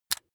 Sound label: razor rickety fold out